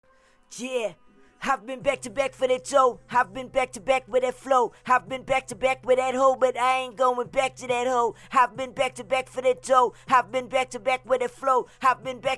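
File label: hip hop 138 C major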